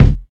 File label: studio steel kick drum e key, Royality free bass drum one shot tuned to the E note; kick drum samples